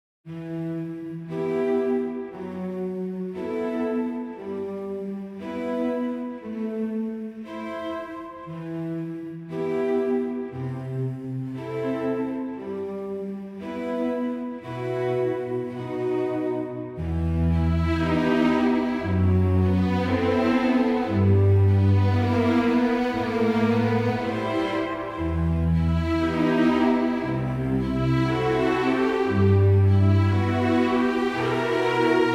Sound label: Classical Theme Overture